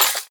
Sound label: weapons m82; Urban-Coalition; Anarchy; arccw ue